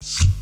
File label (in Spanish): golpe duro